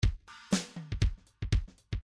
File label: midi fills funk